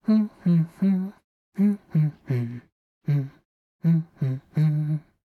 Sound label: voice humming